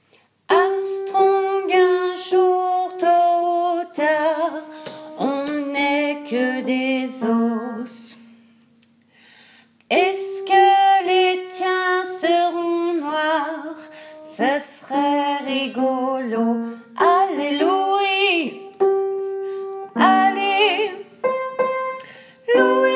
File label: alto